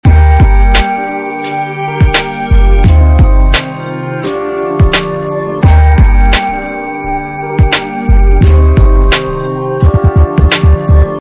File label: Catholic 86bpm